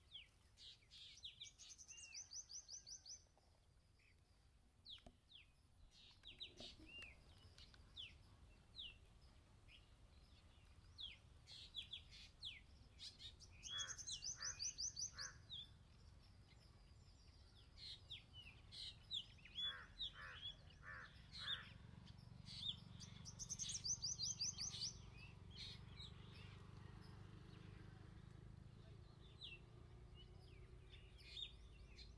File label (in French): jolis oiseaux indiens; une chambre en inde